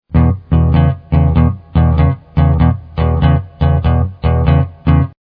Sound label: blues guitar